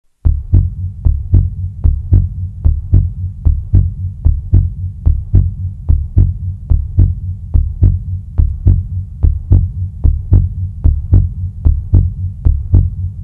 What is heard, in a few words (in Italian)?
Battito Del Cuore